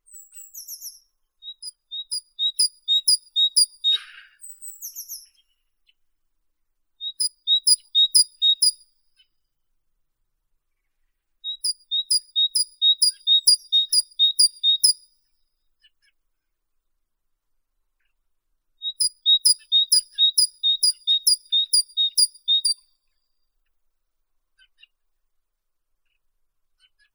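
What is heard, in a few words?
great tit parus major; sinitsa bolshaya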